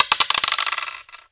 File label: Dice